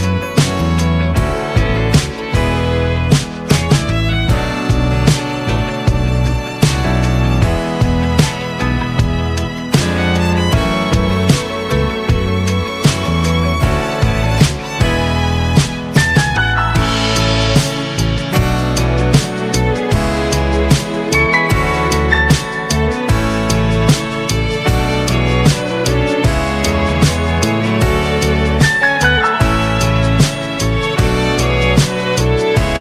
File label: lighthearted tune